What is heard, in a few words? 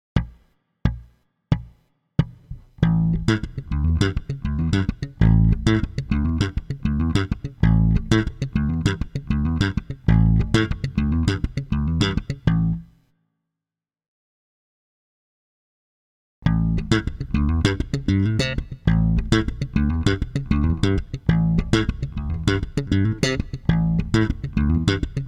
Patterns Slap